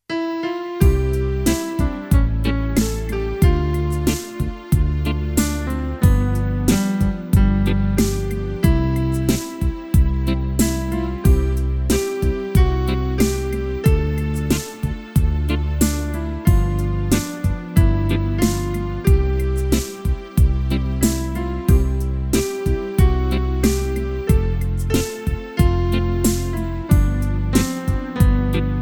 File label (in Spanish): organo